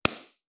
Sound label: Body Slap